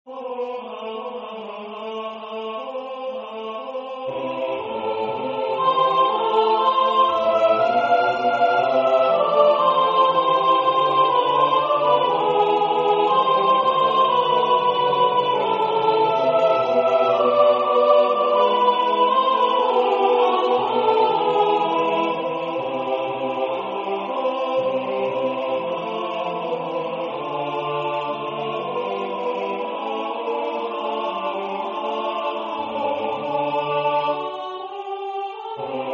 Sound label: Bass solo chorus Sopr.; H.Purcell